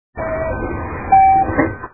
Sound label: door, train